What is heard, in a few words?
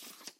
turn